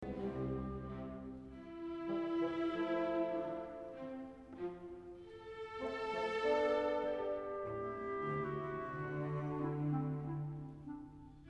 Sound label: Andantino; Elgar; Enigma Variations; VI- (Ysobel)